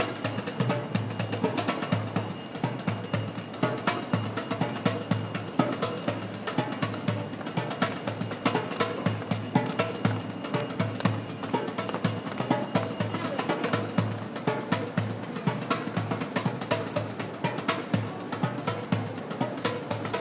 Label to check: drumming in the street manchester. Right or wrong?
Right